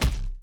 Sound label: MOVE; C64 Dreams; Multi-Sound Default